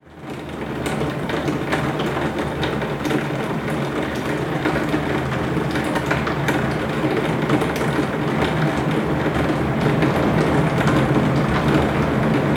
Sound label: London rain; field recordings